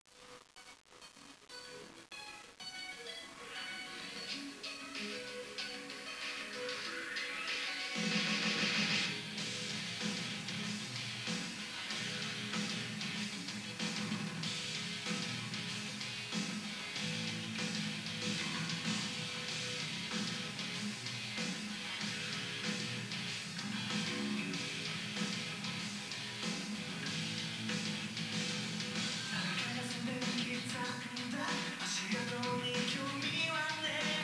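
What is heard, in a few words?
background music karaoke; usen; yakuza ryu ga gotoku kiwami 2 pc gamerip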